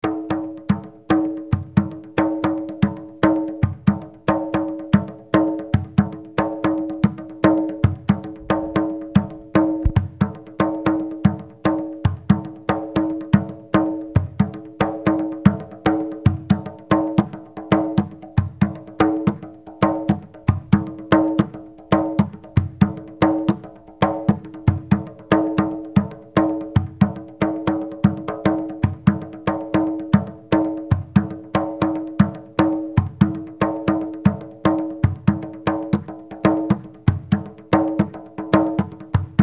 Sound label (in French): rythmes takouta rythmique